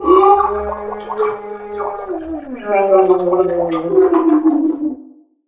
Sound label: drown; human; NS Studios - ultra sound collection